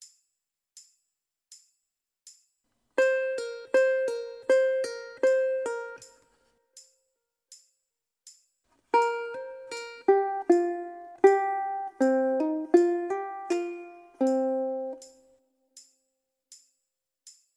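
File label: pull offs combined hammer ons, ukulele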